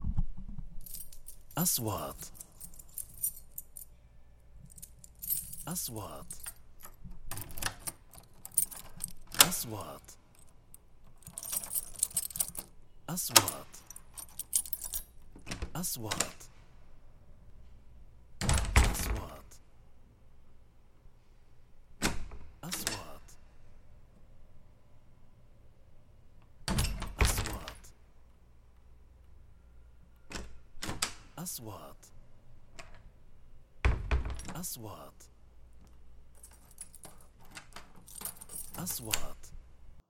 Wood

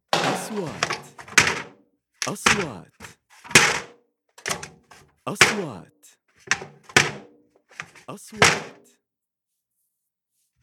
Kitchen tools hit

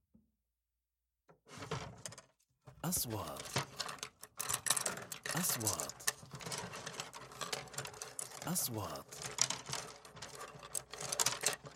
Wood

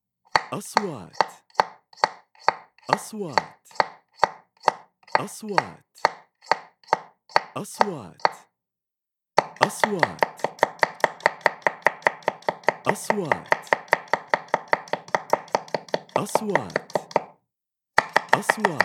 Sound cutting vegetables fruit; kitchen

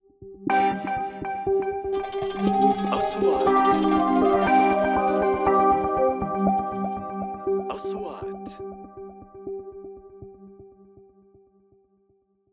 intro qanon

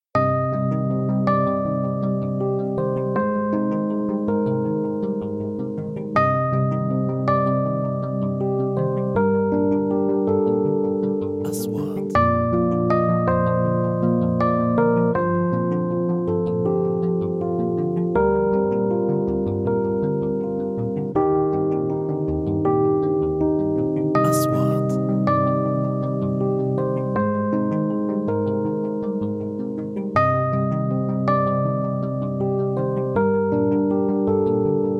full piano

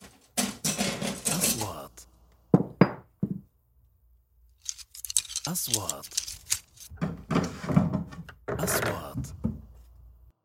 Wood

